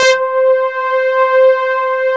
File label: SYN, synthesizer bass; VFX-SD